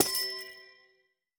紫水晶簇破坏声

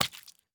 蝌蚪受伤声

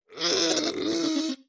撤退声; 炽足兽

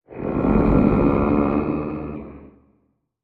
焦躁声; 监守者

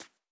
兔子跳跃声

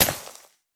可疑的沙子破坏声

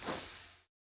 蜡烛 熄灭声

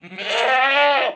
山羊尖叫冲撞前声

